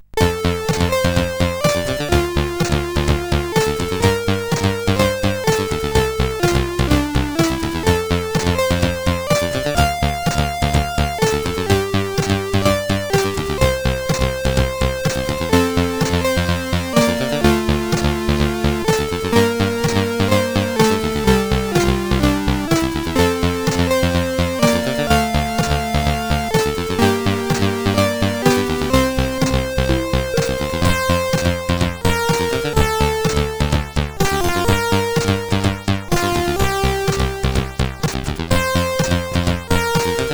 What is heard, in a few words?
Airbus, CSG8580R5